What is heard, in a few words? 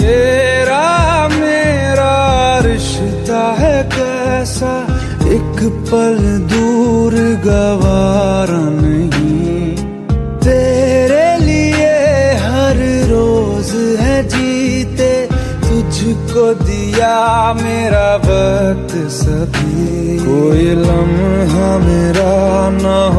romantic ringtone, bollywood ringtones